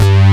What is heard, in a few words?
FAT Z BASS; Pro-Rec - Synthsonic; Z1 DANCE